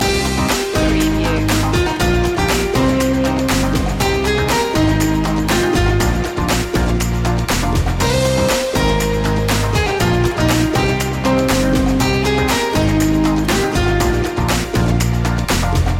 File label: flowing rhythm loop